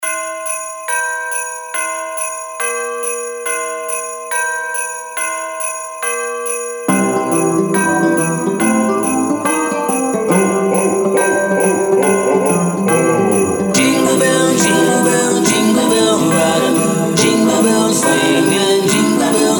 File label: Jingle Bells Dubstep Remix